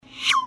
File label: punch; petparty